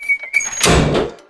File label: switch lever